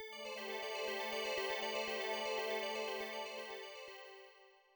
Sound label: Delicate Rhythms In GB